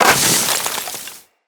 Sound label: Melee Impact Snow, Weapons